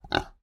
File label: animal pig kunekune grunt